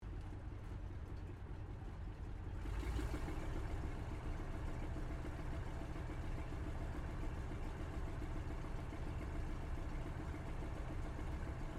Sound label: AIRCRAFT CAC WIRRAWAY TAXI INTERIOR; CA-16 Wirraway; Airplanes; Vehicles; Aircraft Collection; Twistedtracks